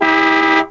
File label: Radio Fade